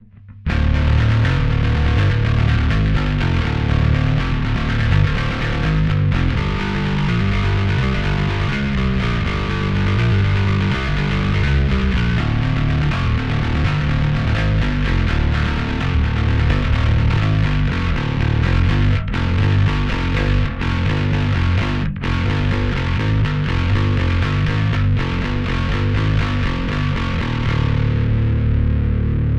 Metal dry

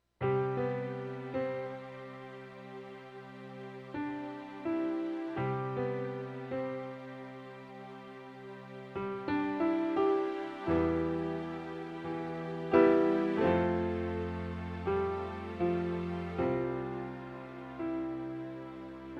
Layer Sounds; Prodipe

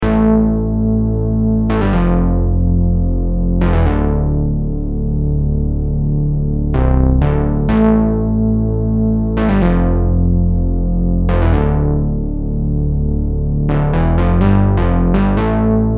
Long Lead Modulated; Roland SE-02

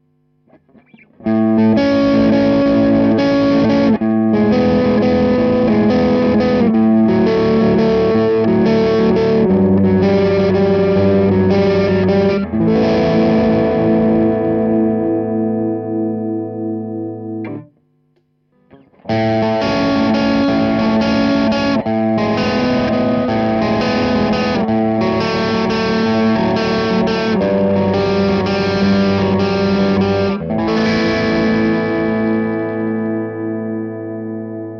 Dirty

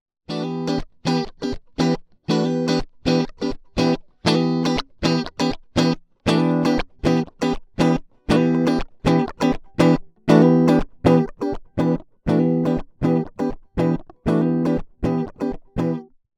Strat passive jfet Z Tone Sweep; IK Multimedia Axe I/O Solo

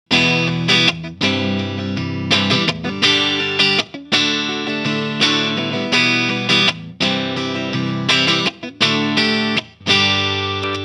Super Swede Clean; Hagstrom

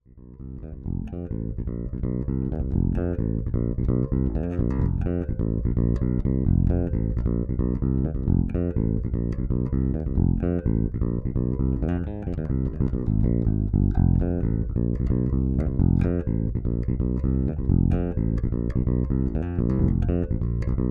Radial J48 Fodera Monarch Lowcut

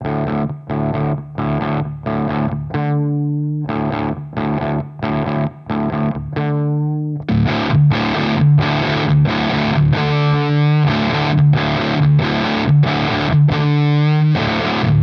Boost B Stacked Distortion A

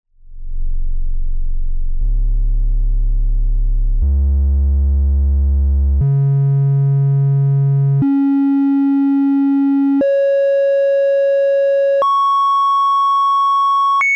Moog Sub25 Waveforms

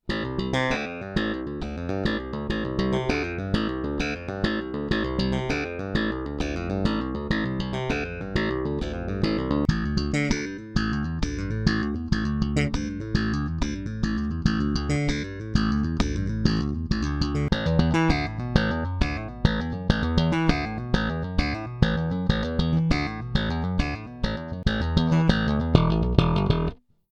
Aktiv High Boost Parallel Bridge Both Neck Pickup

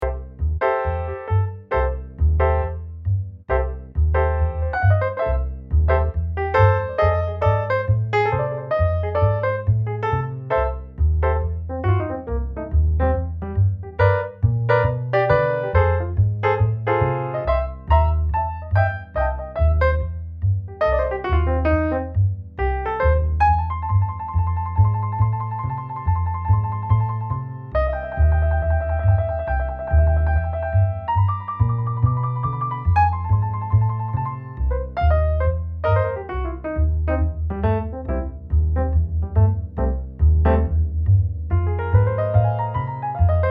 Nord Grand Bass Grand Split

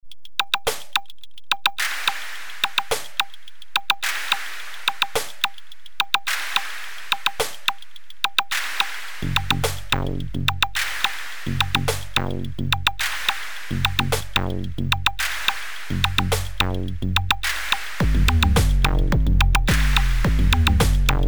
drum Downbeat

